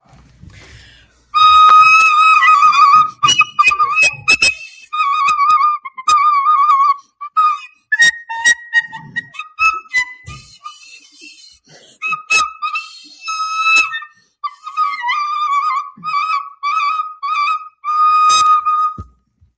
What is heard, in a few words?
terrible recorder